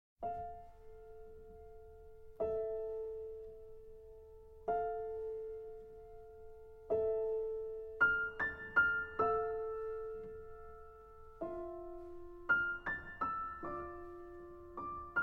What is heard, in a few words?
Piano